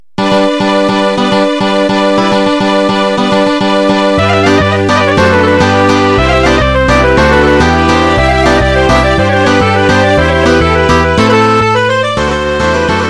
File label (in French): Horo - Bulgarie